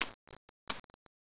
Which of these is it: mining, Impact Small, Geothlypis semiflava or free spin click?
mining